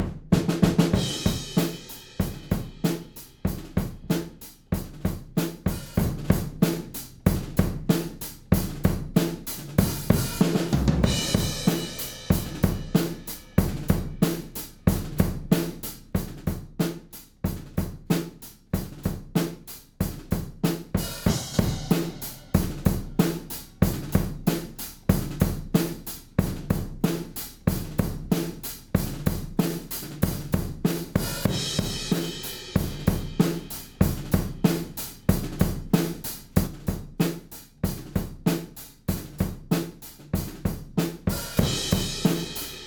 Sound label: drums